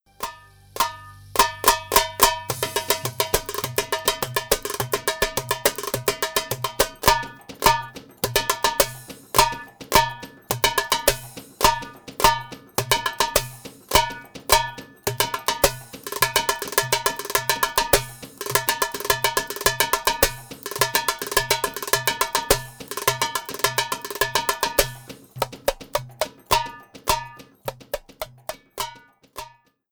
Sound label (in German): Trommelsolo